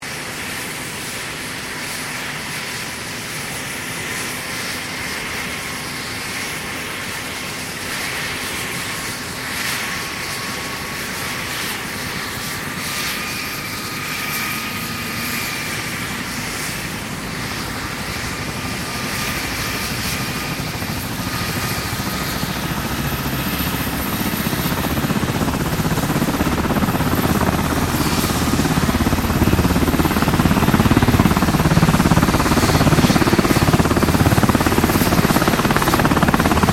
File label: HELICOPTER 1990 SIKORSKY UH60 BLACKHAWK PROPS STARTUP; Helicopters; Vehicles; Aircraft Collection